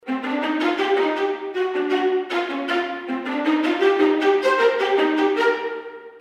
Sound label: String Ensemble Violas Spiccato